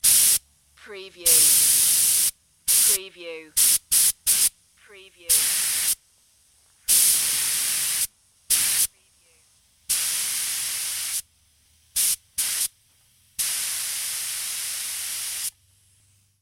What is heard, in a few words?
Paint Spray Gun Leak